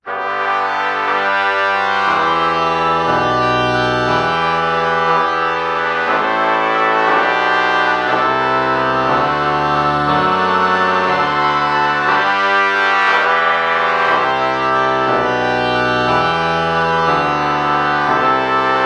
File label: oboe trumpet morph